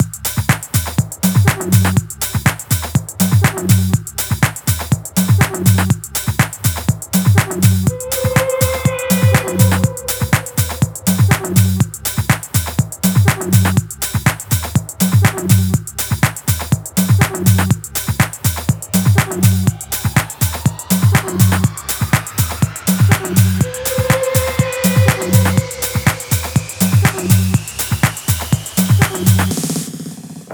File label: pocket beat 122bpm techhouse mix